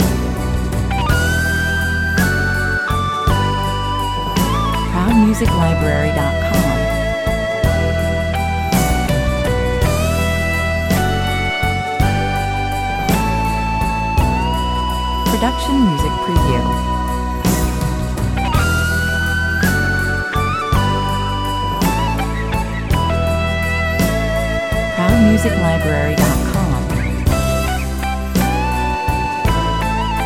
guitar parts

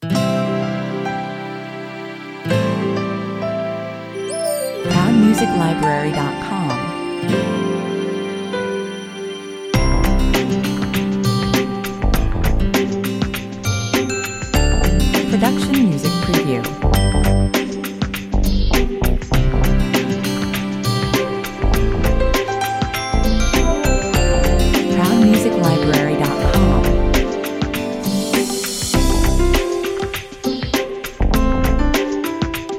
jazz, 100 bpm

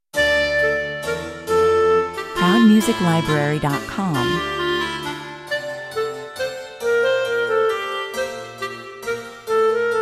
clarinet

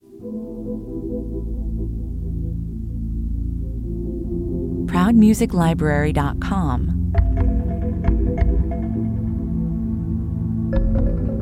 percussive 134 bpm

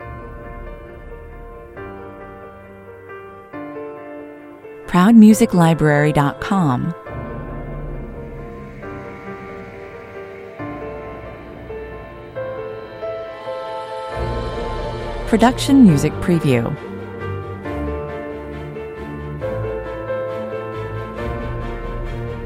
no choir relaxed

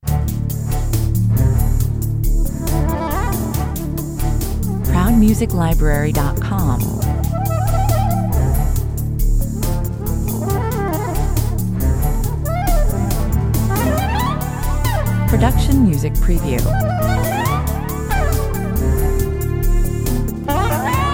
sax hunting

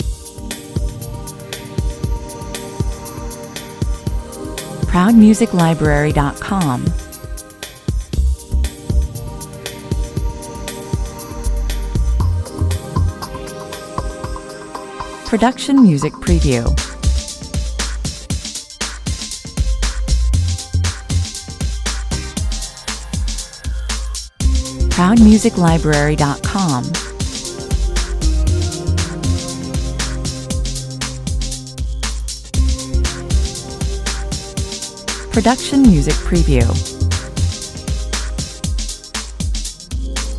on hold, loops, 118bpm

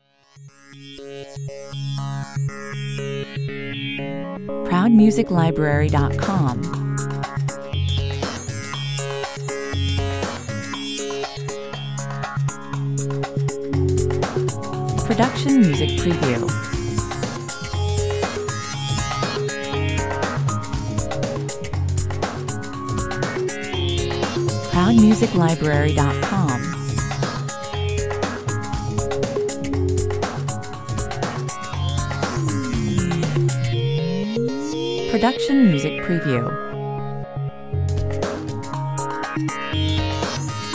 uptempo world electronica, 120 bpm

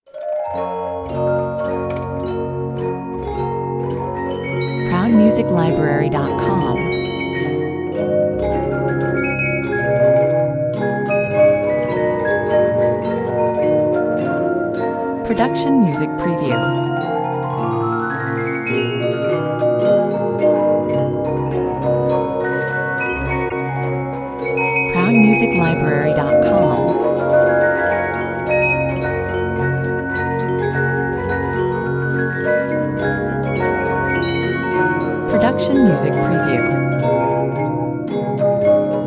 music box theme

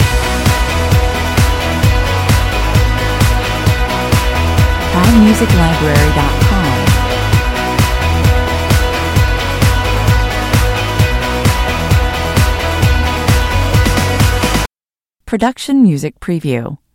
neon guitars loop

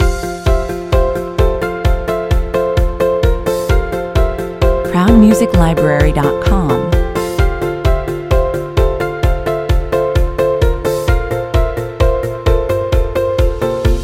corporate awakening loop

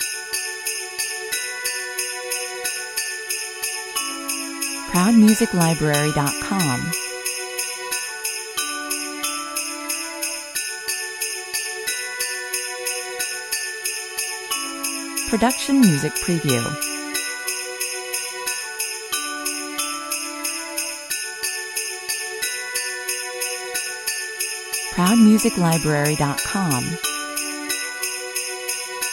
christmas bells 91 bpm

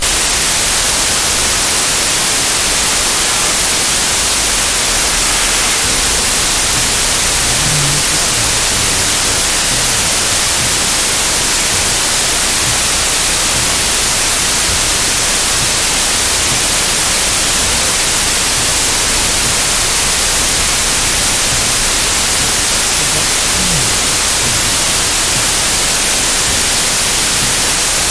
future danger 166 bpm

